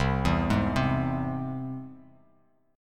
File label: C Augmented down Bass Standard; arpeggiated; JGuitar